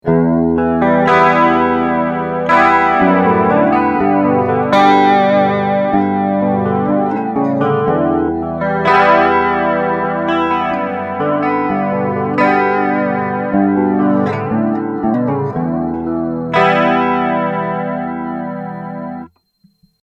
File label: warm flange